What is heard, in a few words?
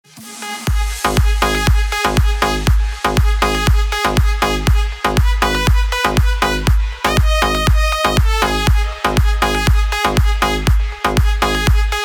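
intensive club fragment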